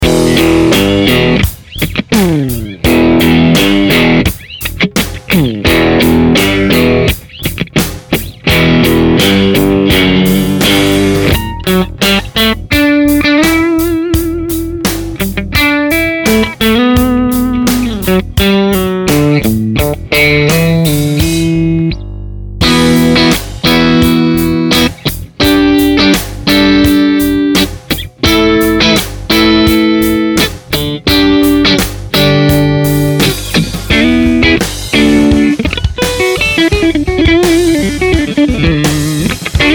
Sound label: Head Strat Clean; DRZ Carmen Ghia